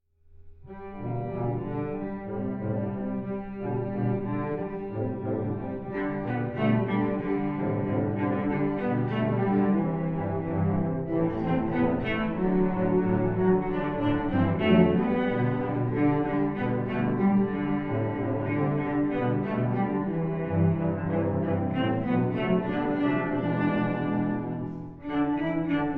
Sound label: Jingle Bells